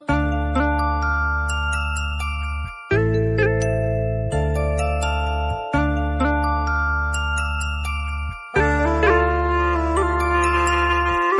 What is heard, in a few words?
emotional mobile